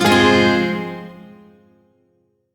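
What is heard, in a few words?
Shawzin Minor Chord; G; Warframe; Duviri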